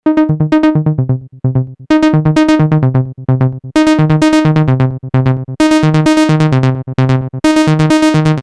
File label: Saw no Reso normal Acc Decay